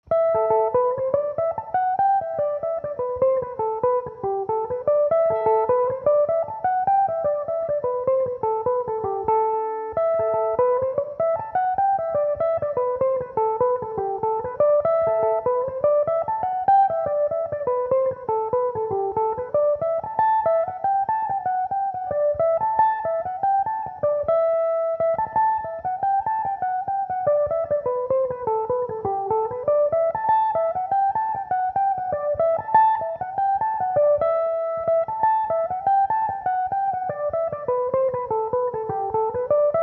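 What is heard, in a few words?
A dorian, irish mandolin, tunes; Belmuse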